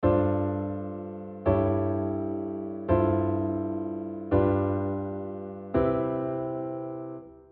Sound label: pedal point - resolving